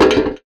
Percussive Hit, contact mic; Percussive Hits; musicradar; contact mic madness

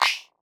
on off, Switch FX; Masterbits

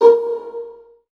Virtuoso Strings PZZ A#1 L; ILIO; Kirk Hunter Virtuoso Strings VOL-2

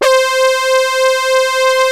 ARP VCF; OLD SYNTHS; Rubber Chicken Software Sample Bank

